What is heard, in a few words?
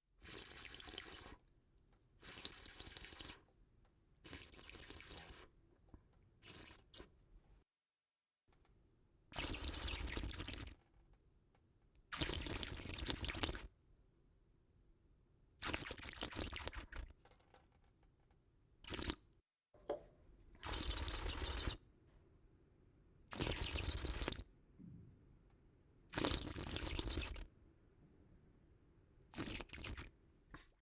slurping series